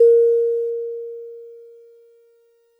DX100 As3; Dream Like

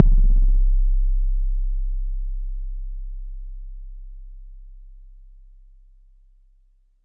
BD

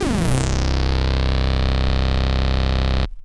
Unison Dive Vinyl Synths C1, bass

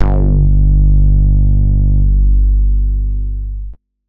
90s club bass G2

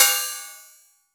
Cymbal

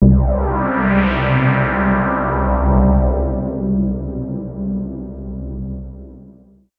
pad C1

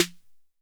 SD 808 Air

